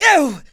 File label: Voices Male HurtGrunt